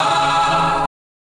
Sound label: Key chant; luxembourg zwee